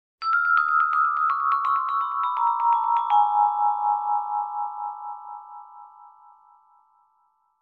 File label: Icy vibraphone descending melody